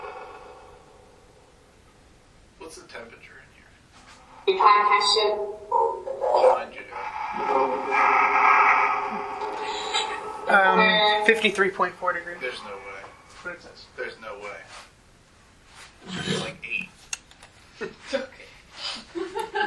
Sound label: Basement Spirit Box; wildwood sanitarium investigation